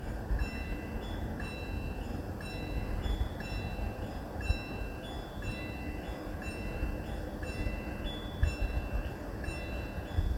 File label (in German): Bahnsignal